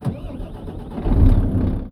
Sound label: eng on, Volvo 9900; Vehicles; AI volvo 9900; Freyfurt Beta